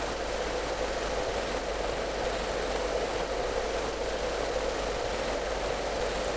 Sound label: Aircrafts Engines Jumo213A Prop; DCS